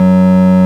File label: ORG, Farfisa Clarinet; Roland LCDP09 Keys of the 60s and 70s 1